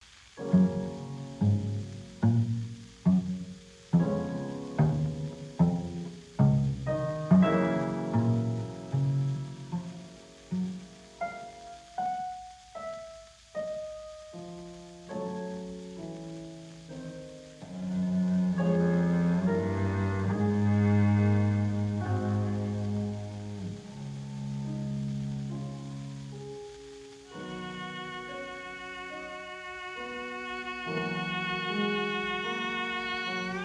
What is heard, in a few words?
Brahms Cello sonata II. Adagio